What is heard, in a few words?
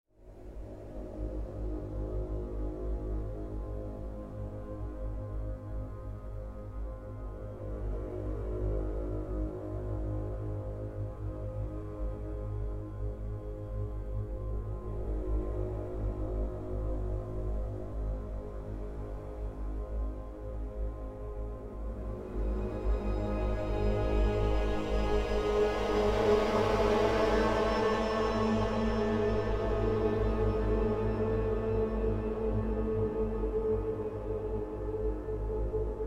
Orchestral Scape